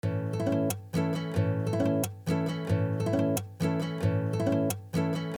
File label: 6/8 kereshme